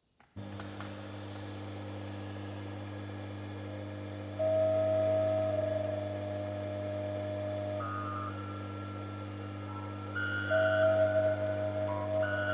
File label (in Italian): organo voce